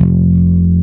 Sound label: bass (F) long note; Sonic Foundry (Sony Creative Software); Ugly Remnants VOL-2; Basstuff